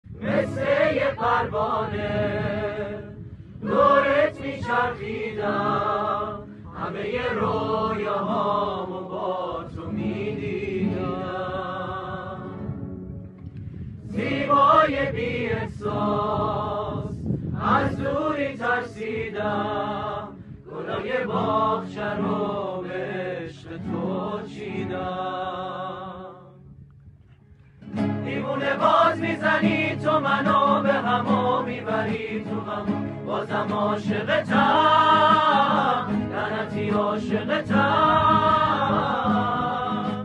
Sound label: Mass group performance witth guitar